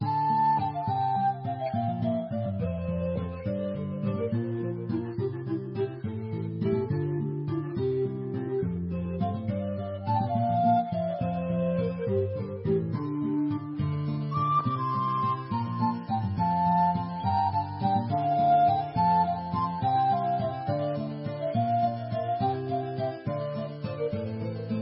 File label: music menu